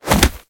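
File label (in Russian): тяжеловесный тупой удар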